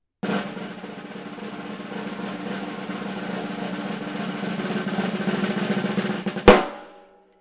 skintone roll, drumline

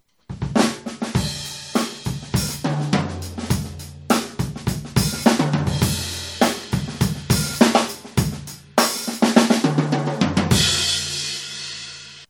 oak, drumline; OAK CUSTOM; BD22X17 TT12X8 13X9 FT16X16

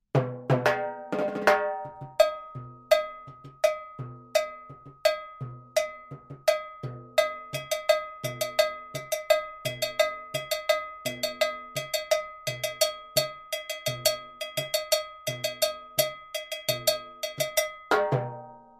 LP cowbell

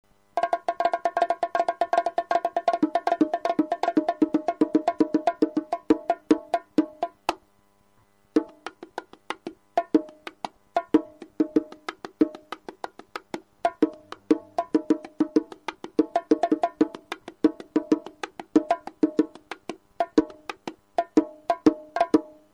bongo, NOMAL PATTERN; FIBERGLASS; LP200XF

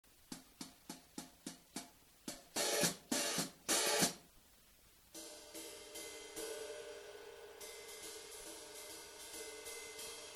cym, brushes

